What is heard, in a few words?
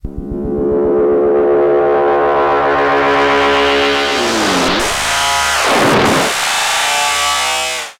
Hair Dryer